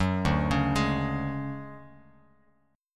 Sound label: D-Major Sharp 11th, D, Bass Standard; arpeggiated; JGuitar